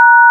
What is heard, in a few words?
Dtmf Sharp